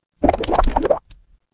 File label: water bubble